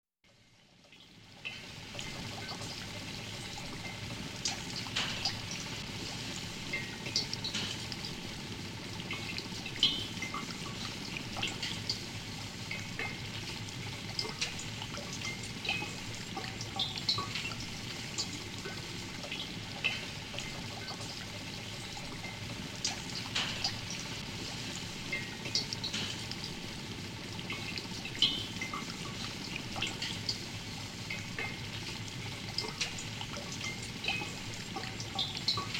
Chemistry Lab ambience

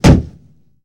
Body falls on a hard wood surface

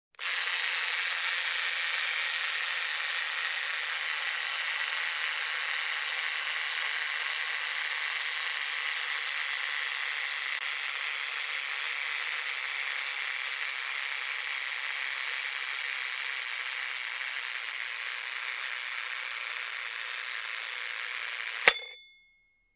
Thin thread unwinding quickly

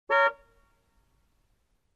Car Horn once and short

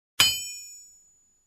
Hammer on Metal

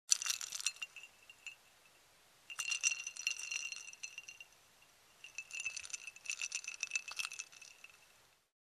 Stiring cold drink with straw

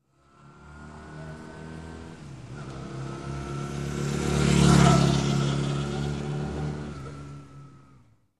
Motorbike passing by

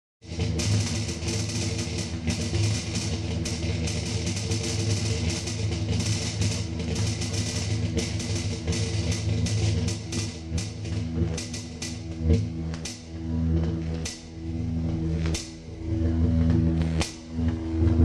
High voltage humming and crackling

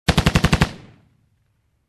Military machine gun firing

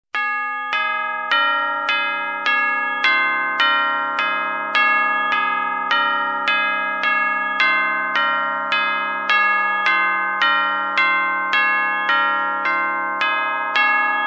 Clock bell melody

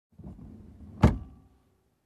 Car Door Close

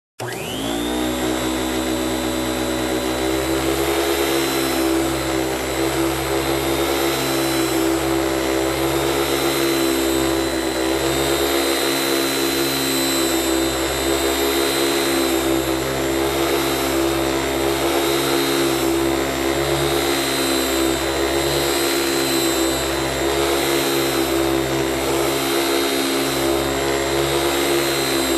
Hand Mixer

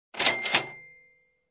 Purchase sound cash register

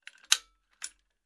Machine Gear clank

